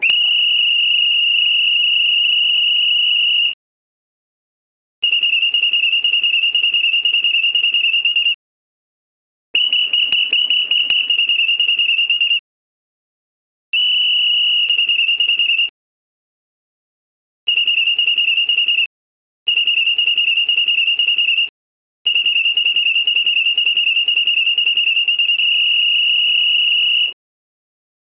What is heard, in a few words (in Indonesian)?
suara peluit